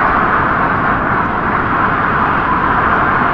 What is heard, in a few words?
Skid Generic Alt; Totaled; XBOX; skids